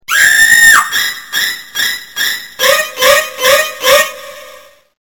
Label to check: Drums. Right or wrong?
Wrong